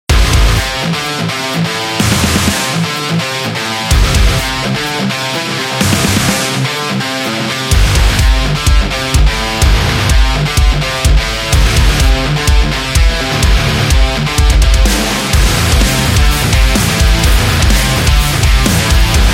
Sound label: Metall